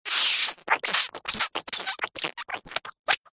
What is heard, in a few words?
cartoon baby suck pacifier dummy; zapsplat